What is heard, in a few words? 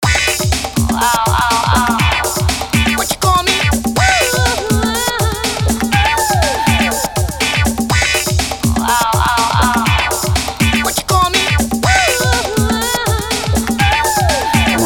funk